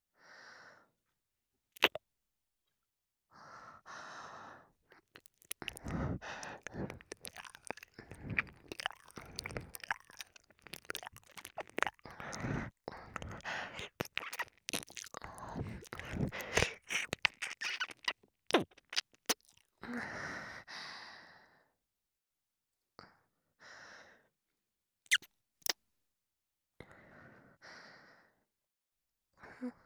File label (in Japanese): 耳舐め キスとスキ